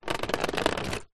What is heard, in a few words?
electronic typewriter chassis creak movement stress brother ax 450; office